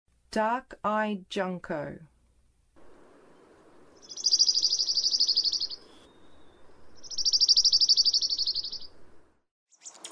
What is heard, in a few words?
Dark-eyed Junco; North America